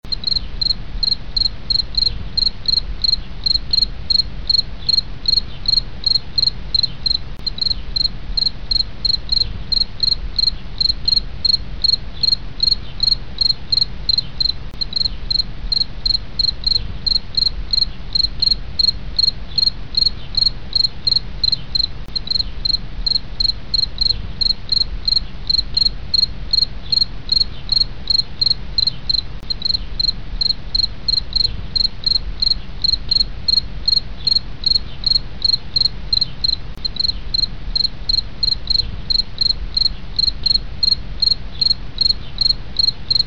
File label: field cricket